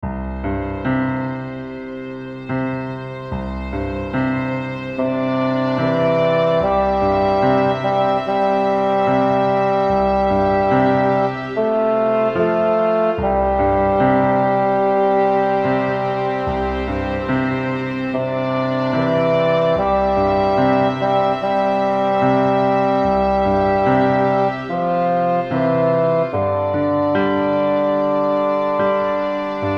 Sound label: Kum Ba Yah SLOW Tempo, ukulele; Rainbow Ukulele Play-Along